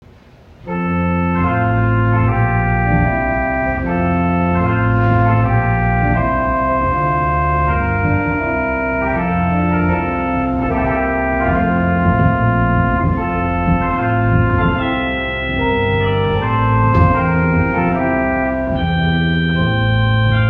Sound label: Air Water Music; Handel; wedding music